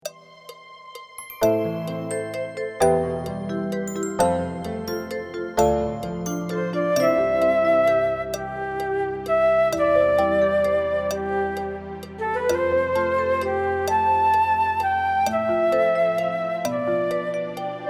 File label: ballad